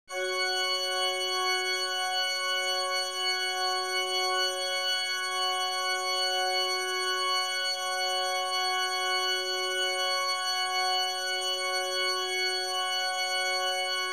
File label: F Drone